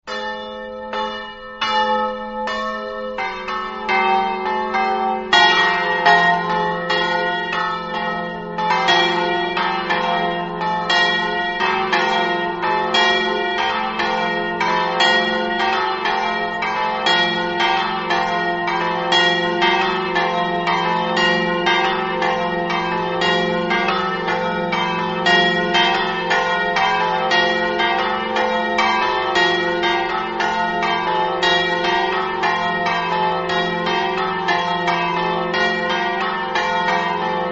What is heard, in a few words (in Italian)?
campane, grupignano